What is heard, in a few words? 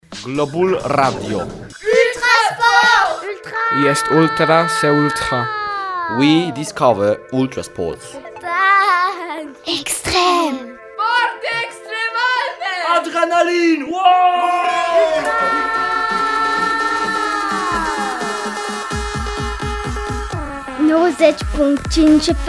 JINGLE PAD; Chamonix; atelier ultrasport 21; mini reporters; sports ULTRA